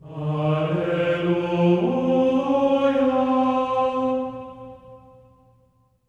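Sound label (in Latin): alleluia F4; Spectrasonics; Symphony of Voices; GR ALLELU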